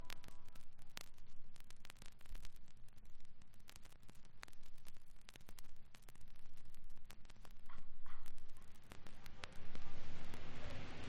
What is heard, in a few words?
Rain Falls